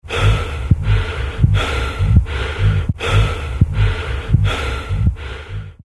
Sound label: exhausted